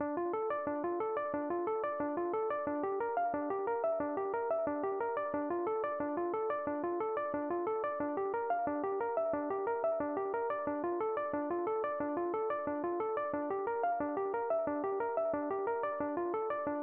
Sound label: hip hop